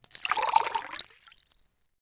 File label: Water Pour; Civ4 warlords